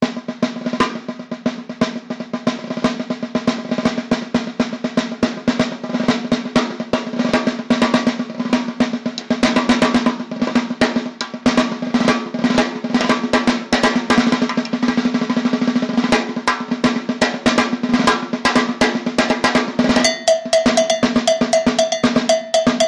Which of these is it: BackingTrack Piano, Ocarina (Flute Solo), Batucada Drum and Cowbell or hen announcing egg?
Batucada Drum and Cowbell